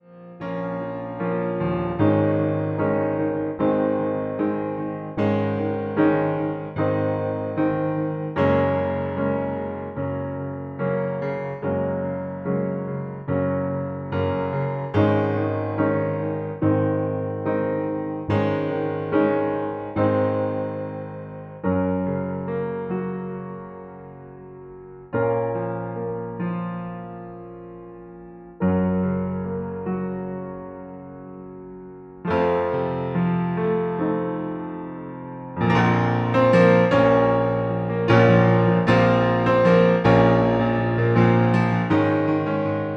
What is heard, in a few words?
Piano